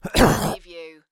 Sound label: Male Cough; beanstalk audio